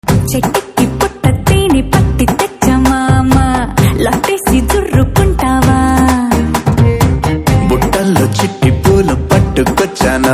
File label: Ringtone; Kondapolam